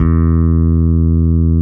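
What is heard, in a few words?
PEAKS BASS E3; PEAKS BS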